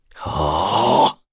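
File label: Vox Casting, Korean; Kain; kingsraid; voices heroes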